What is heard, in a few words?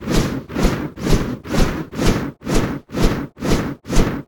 weapon axe cyclone loop